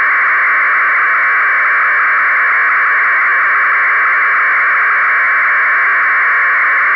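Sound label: Italian military FSK; Italian MIL 1200 Bd FSK modem in ISS-mode; I-MILFSK-ISS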